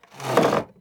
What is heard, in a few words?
Wooden Chair Sliding; Fairlight Sound Lib